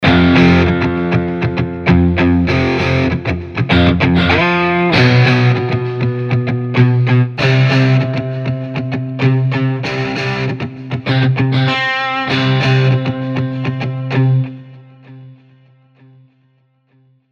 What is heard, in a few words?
Scoring Guitars Rhythmic Pedals